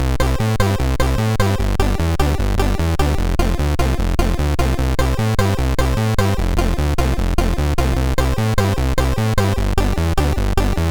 Bomb, MOS 6581

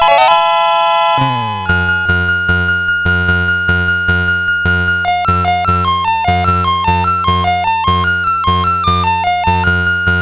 sid CSG8580R5; Dragons Lair

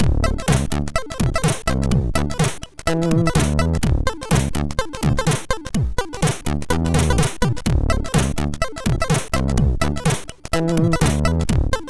MOS6581R2, sid

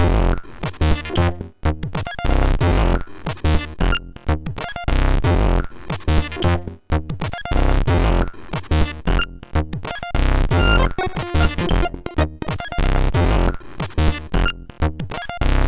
sid MOS6581R3

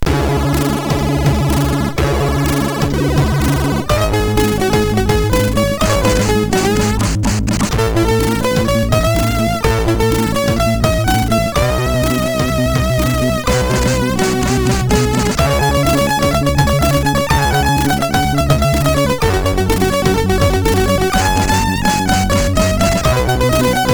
Hawkeye loader, MOS6581R4

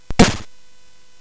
Tecnetium, CSG8580R5; hvsc

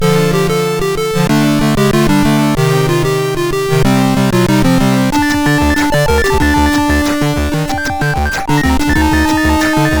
Dusty Action, MOS6581R4

